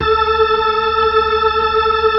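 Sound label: B-3, Hammond B-3 organ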